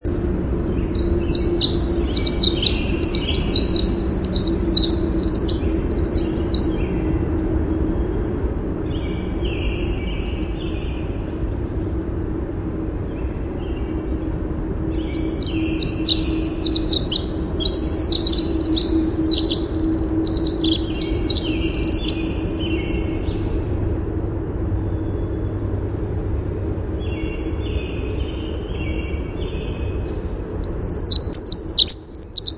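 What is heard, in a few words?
Winter Wren song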